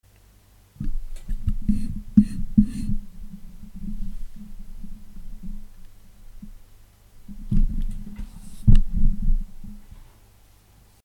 moving mic down on stand

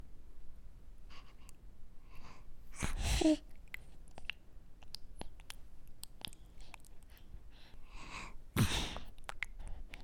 baby cute moan

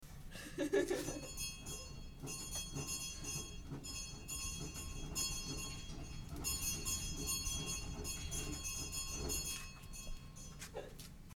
laughter and school bell

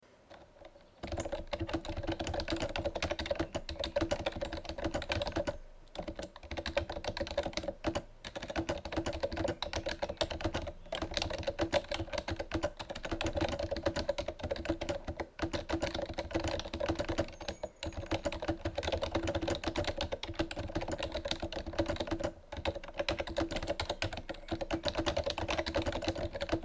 pc keyboard

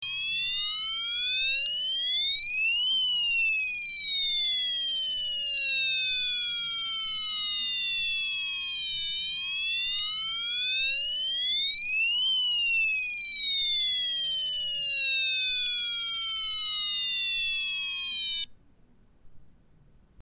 toy firebrigade car siren

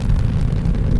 flamethrower End Fire; RainbowThrower

